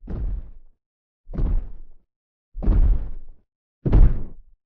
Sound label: Ambient